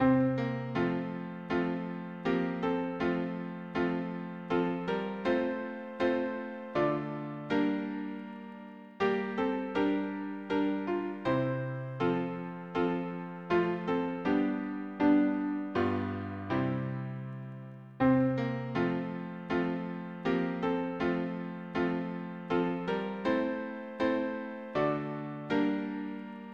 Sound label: harmony piano